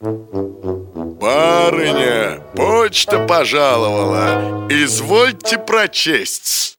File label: SMS RINGTONE